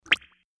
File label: Bubble Pop Wet